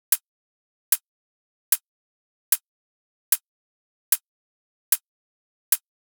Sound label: Dubstep Drum Loop Cymbals, 150bpm; Full Drum Loops (Stem Seperated); Ghosthack; Dubstep Sound Arsenal